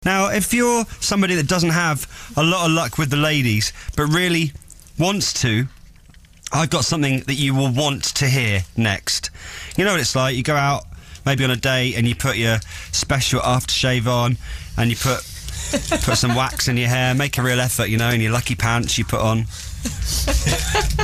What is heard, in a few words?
swooning noise; mary millar